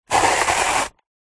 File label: paper shredder manual handle turn single shred paper; office